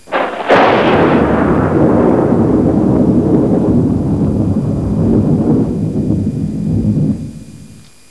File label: Thunder